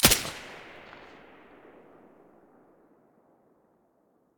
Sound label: silencer stereo, weapons